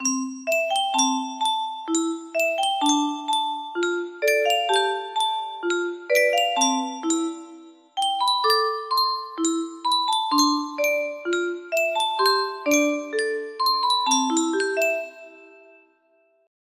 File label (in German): Spieluhr, music box melody